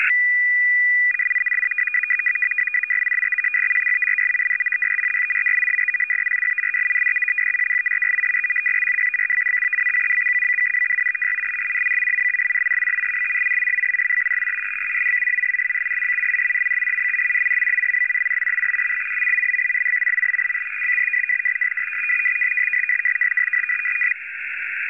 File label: fsk100